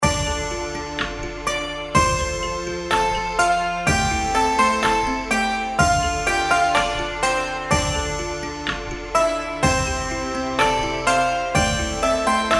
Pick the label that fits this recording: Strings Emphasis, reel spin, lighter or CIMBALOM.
reel spin